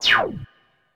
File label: menu exit